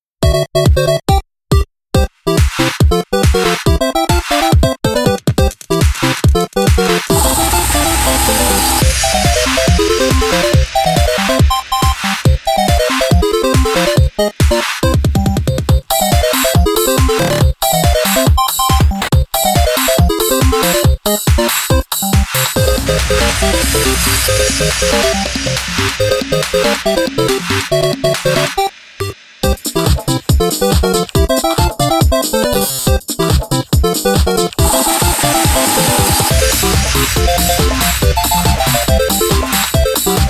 8-Bit Dance Remix

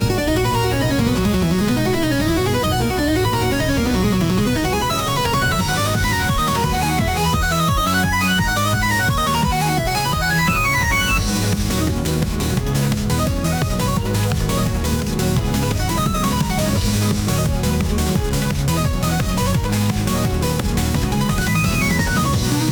Phonk

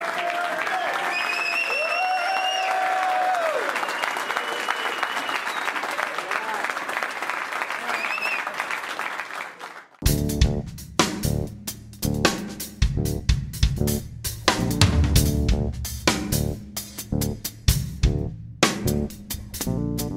11 8 bass jam

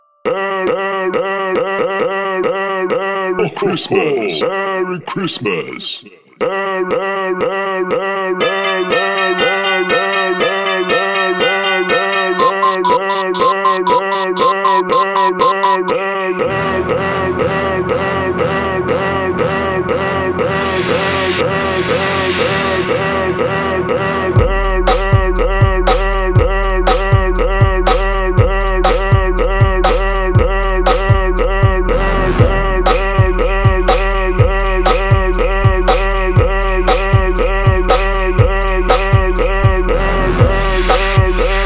Drum Pads

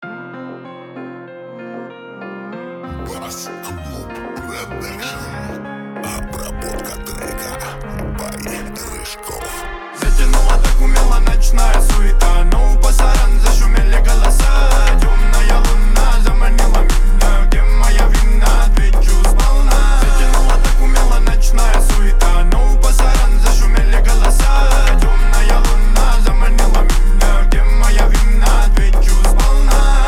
31-35Hz Low Bass

